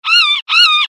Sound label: bird croak